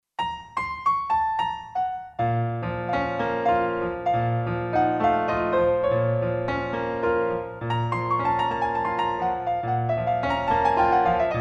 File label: Boesendorfer 290 Nocturne; Steinberg Absolute 5; The Grand3